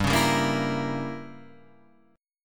G Diminished 7th

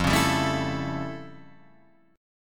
F Augmented Major 9th, Guitar, Open D

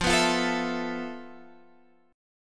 F Major 7th Flat 5th, Guitar, Open F; strummed; FM7b5; x,12,11,12,12,11; JGuitar

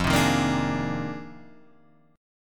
F Minor 13th, Guitar, Half Step Down

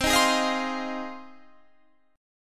Ab 6th C

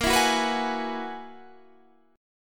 Gb 11th Bb

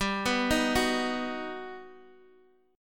B-Major G

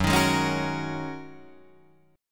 Gb 6th Add 9th, Guitar Drop D

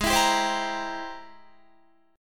Ab Augmented Major 9th Ab Guitar; strummed; Ab+M9; JGuitar

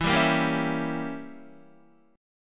E Diminished 7th E down Guitar 4F 4A 5C 5G 5C 6E; Edim7 strummed; JGuitar

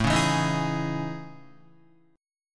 A Major Sharp 11th, Guitar; strummed

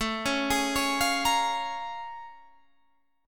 Bb Minor 7th A; Bbm7/A arpeggiated

